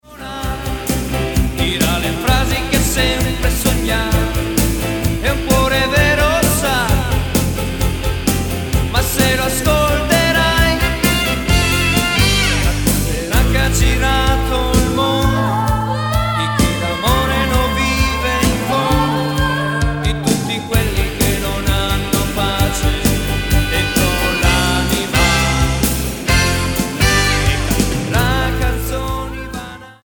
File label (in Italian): solo musica